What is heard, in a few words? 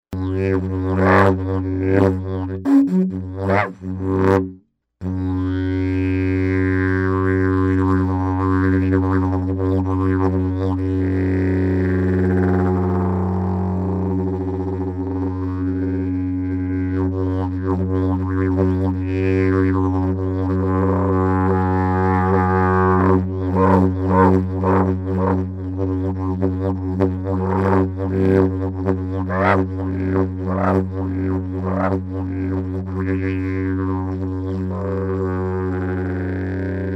Didg Fa; turquoise